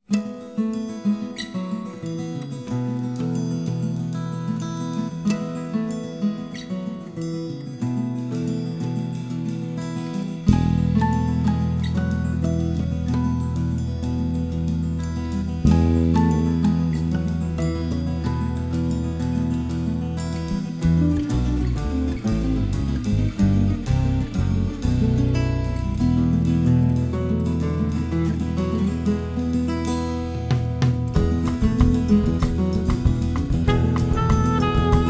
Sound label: samba